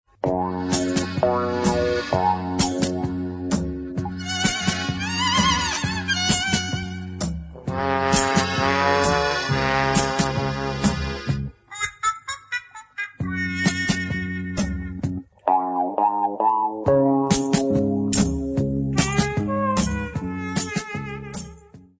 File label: Polka